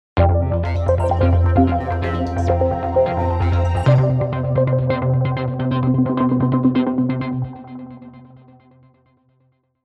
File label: stinger; musicfox